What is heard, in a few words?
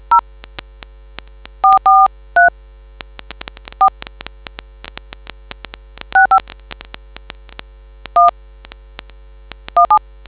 dtmf